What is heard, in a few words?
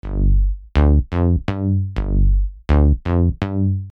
Bass With Sidechain